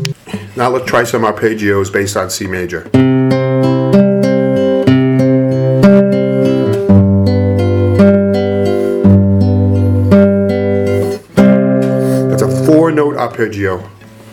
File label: four note arpeggio on c major, classical guitar